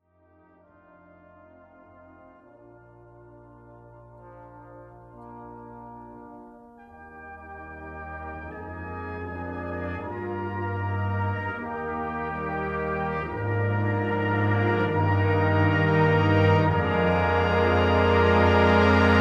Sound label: Deep Harmony, band